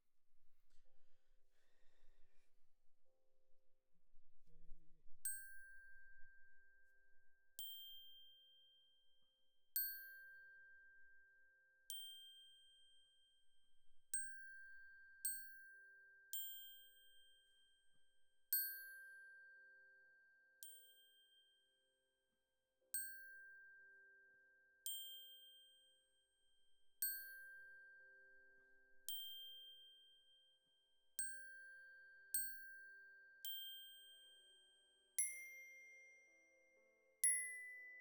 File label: Glock Close